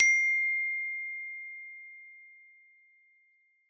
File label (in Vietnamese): Nhac chuong tin nhan iPhone 6 mac dinh